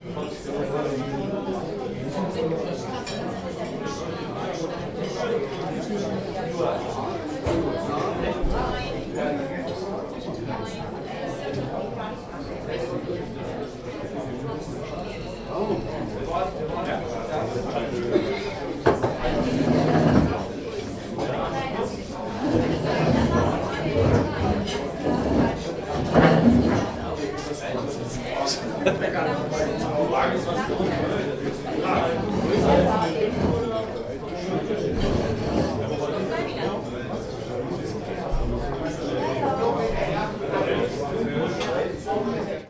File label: public places restaurant germany people chat laughter; speyer; tom chapman